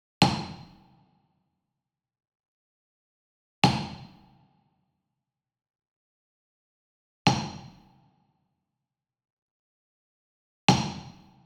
Wood Percussion Wood Block Traditional Small Resonant Hit Medium; boom library; Cinematic Strikes Construction Kit